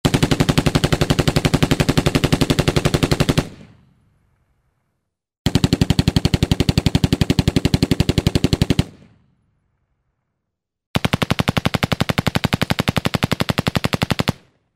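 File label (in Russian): zvuk pulemetnoj ocheredi, machine gun burst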